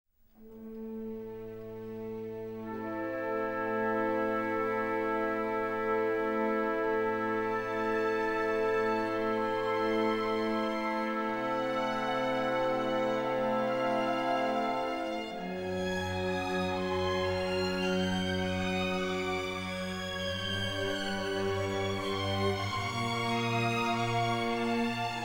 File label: Twisted Strings